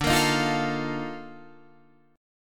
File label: Bb Major 11th D